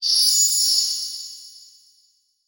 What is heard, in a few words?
Transition Metallic Swell; Production Element; Title Transition; AdobeCC